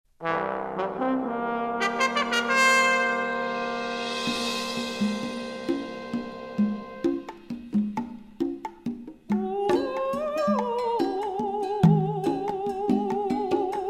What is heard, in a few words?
Cuban Lullaby